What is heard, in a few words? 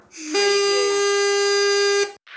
Party Horn